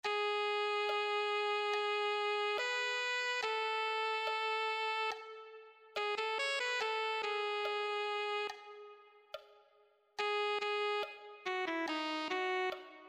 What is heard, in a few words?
alto saxophone